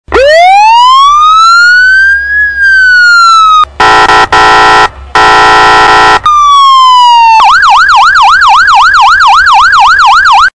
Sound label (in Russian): Signalizacya